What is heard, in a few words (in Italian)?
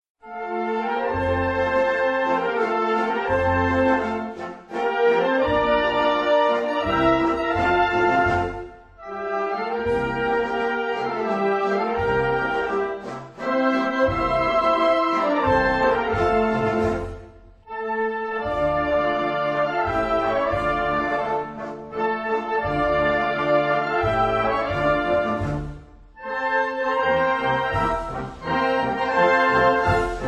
Inno Nazionale Greco